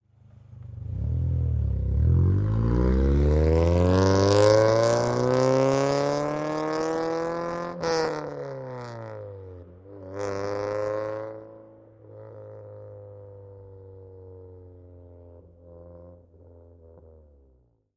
Integra medium speed away, noisy muffler, long away, Acura Integra